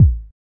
Kick, Kickdrum; Equipped Music; Loungin House

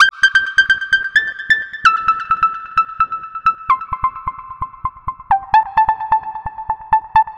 Arpeggio Line, Synths; Sonic Foundry (Sony Creative Software); Modular Electronica

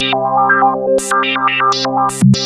RANDOM VCF

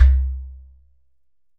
GHATAM

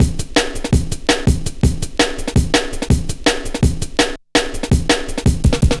Cool, Drum Loops, 165.5, Jungle; Zero-G Jungle Warfare 1, 2 & 3

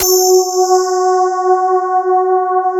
CMI PIANO; Wizoo - Powered DX

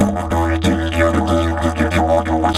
Didge 93 BPM, Didgeridoo; Big Fish Audio - Didgeridoo and Other Primitive Instruments

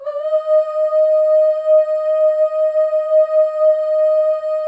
OOSC, FEM OOS, Voices

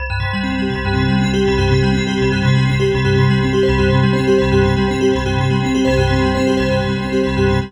amb, Bell Atmos, Chillout; Best Service ProSamples vol.36

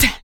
HIT, Jazz FX FEM PERC

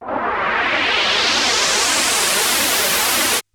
Juno Noise

